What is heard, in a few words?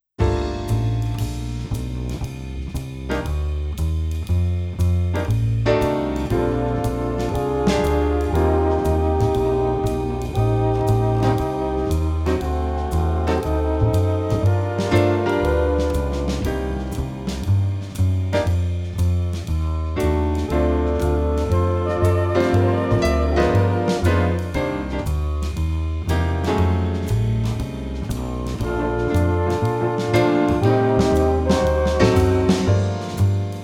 4 Beat Off Vocal; Neon Genesis Evangelion; TV Size version